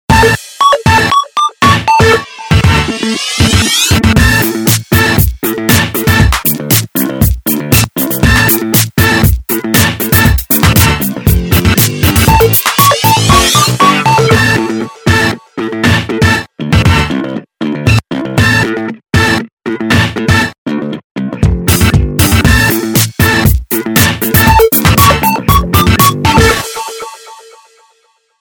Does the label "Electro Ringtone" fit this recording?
Yes